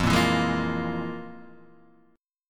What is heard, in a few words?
F Minor Major 7th Double Flat 5th, Guitar, DADGAD